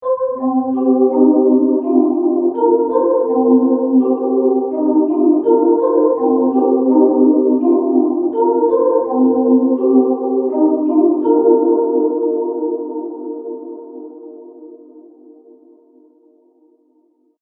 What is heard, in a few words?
glowing edges electric piano pattern